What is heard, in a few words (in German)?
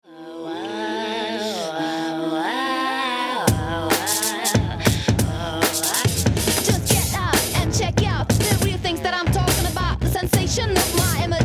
gesang